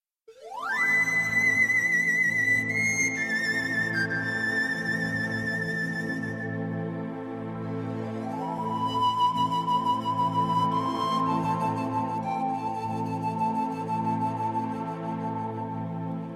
Flute Sms

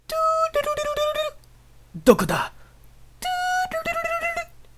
ring ring

